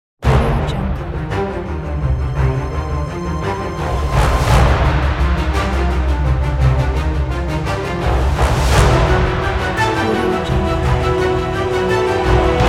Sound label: epic trailer